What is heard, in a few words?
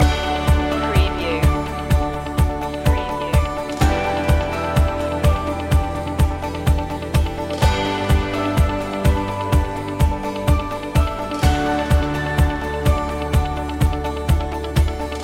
vacation road loop